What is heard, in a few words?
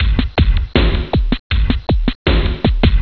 electronic, Boombap drum loops